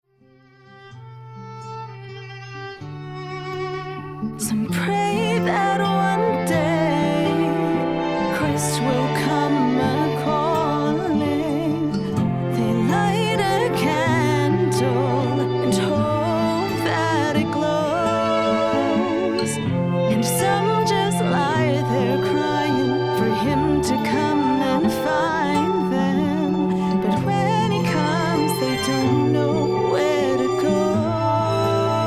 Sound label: Singing